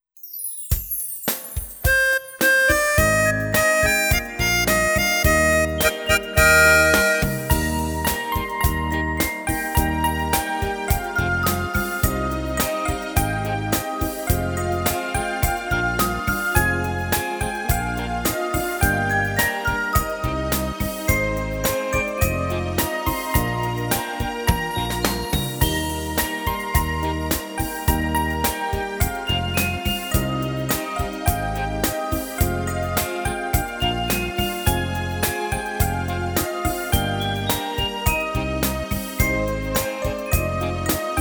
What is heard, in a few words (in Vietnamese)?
beat nhac mam non